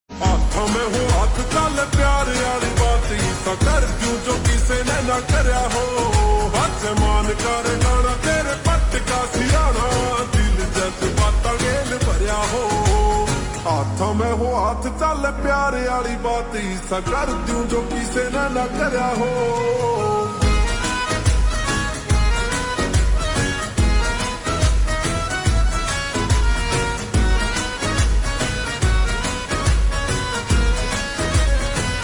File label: Ringtone Slow Reverb